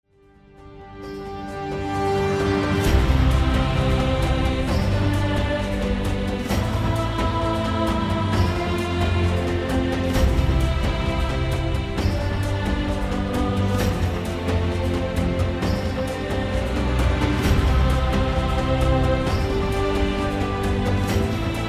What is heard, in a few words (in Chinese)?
专题片背景音乐